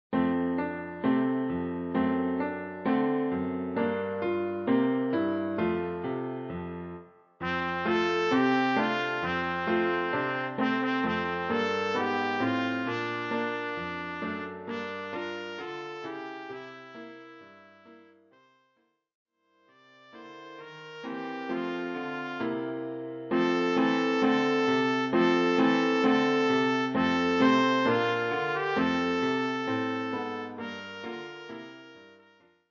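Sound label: trumpet; Jingle Bells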